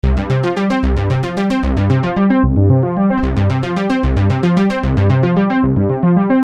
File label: Arpeggio 150 C, Techno Synth Loops; Sonic Foundry (Sony Creative Software); Signals I